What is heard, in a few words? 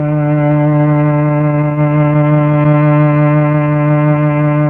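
STRINGS Mellotron Cello D, Mellotron Slow Combo; Keyboards of The 60's and 70's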